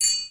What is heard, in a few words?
correct